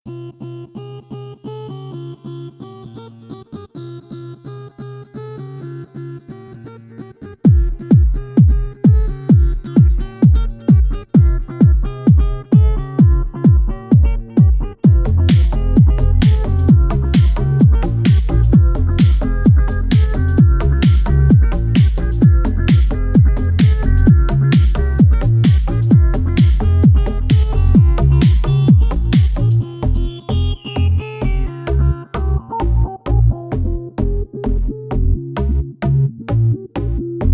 techno trip 432 hz

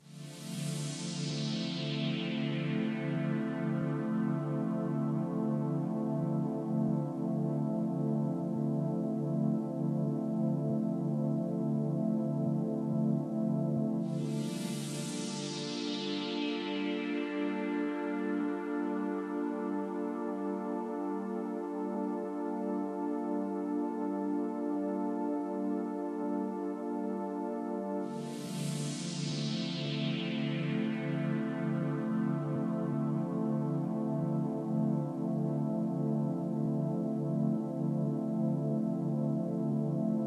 enigmatic dance 432 Hz

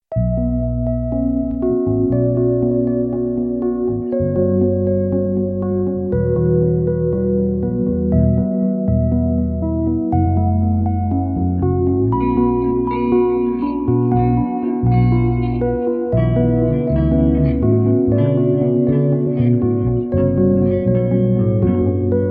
432 hz guitar chill

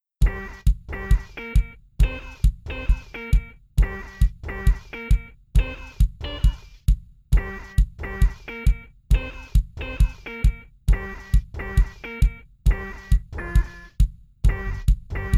fitness music 432 Hz; 135 BPM

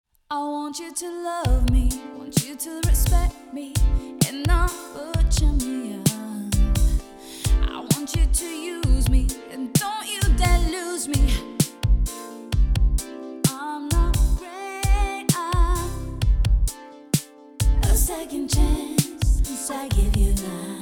vocal chill 432 hz

